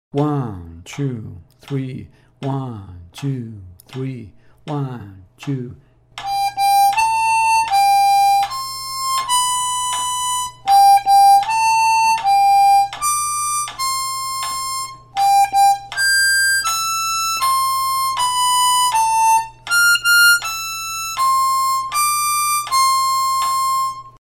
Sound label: Happy Birthday 80 bpm, harmonica